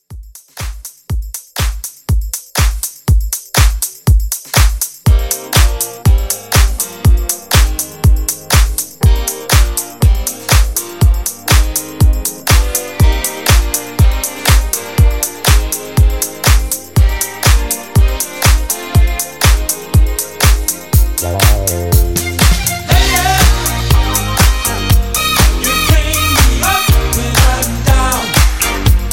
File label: 80s Disco Re-Drum 121